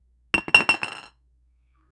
cup lifting; mug

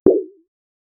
metallic hollow bounce en; scifi

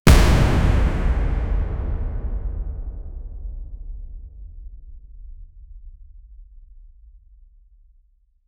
cinematic impact conf

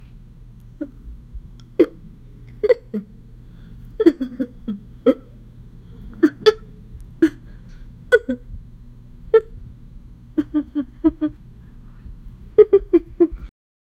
bored and annoyed pouts

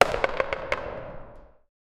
basketball bouncing off

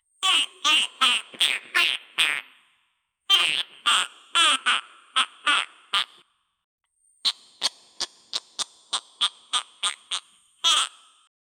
quack quack sound, waterfowl

